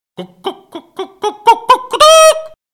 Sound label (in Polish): powiadomienie